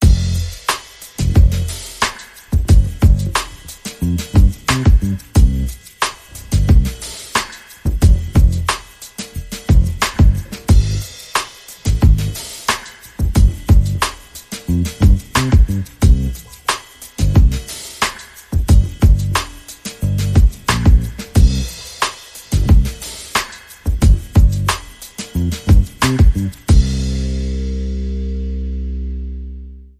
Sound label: Drum and Bass